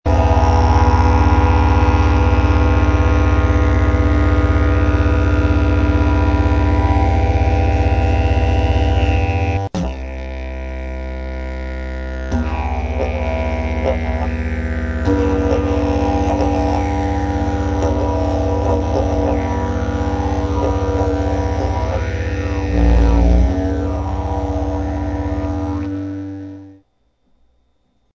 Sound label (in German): akkord